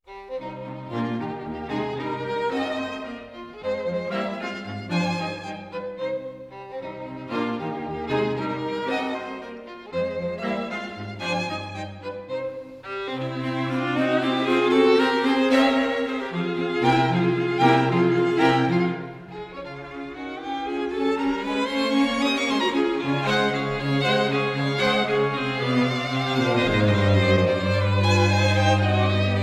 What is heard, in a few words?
String Quartet in C Minor Menuetto Allegretto; String Quartet No. 4; Op. 18 No. 4; Ludwig van Beethoven; Beethoven - String Quartets Nos. 1-16